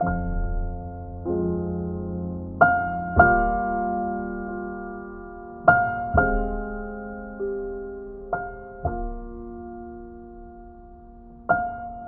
piano muted, Sad; loops

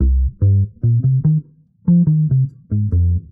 bass, Loops

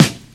Snares, Real

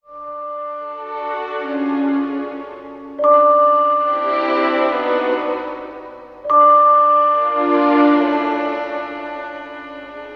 strings and vibes, sad; loops

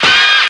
squee, Stabs

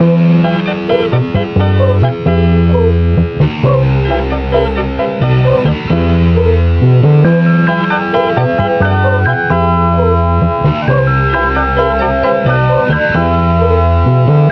guitar and keys blast off; Loops; Phonky

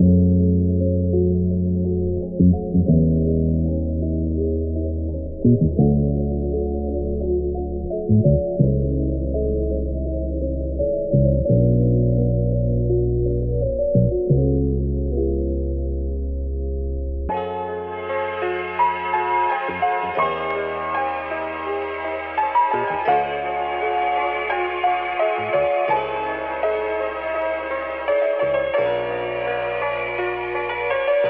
guitar, Sad

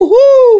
"hoo hoo", voice; ooh ahh

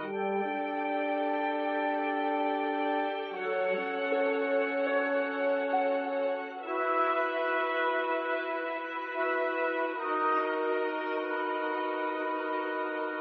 strings, Sad

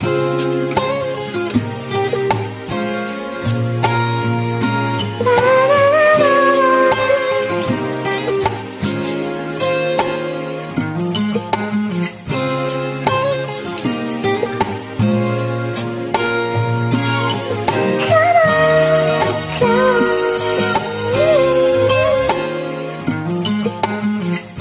guitar, Loops, Phonky

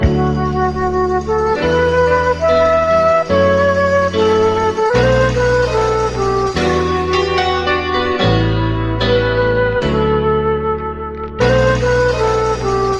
flute piano, Sad; Loops

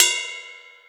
Real Open, Hats

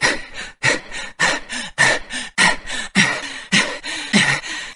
breath

